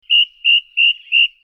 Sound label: sms